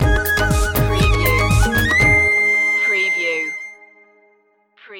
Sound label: bollywood stinger